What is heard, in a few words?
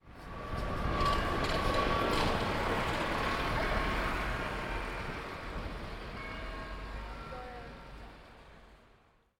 Street Noise